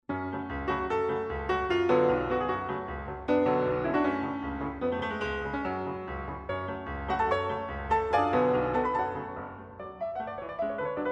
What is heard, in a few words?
hungarian dance 5 fis moll, piano; brahms